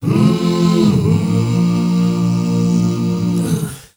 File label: HUMMR, MMM CHRDS; Spectrasonics; Heart of Africa 2